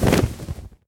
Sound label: wings, enderdragon; mob; Minecraft